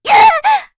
Vox Damage, kr; Rehartna; Kings Raid

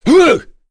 Vox Damage; Ricardo; kingsraid

